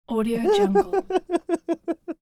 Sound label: silly male laughter